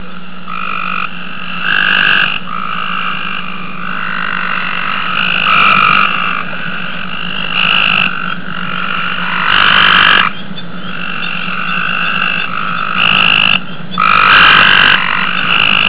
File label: gray treefrog